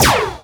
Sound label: lazer shot; birthday party bash; wii; Spanish; laser gremlin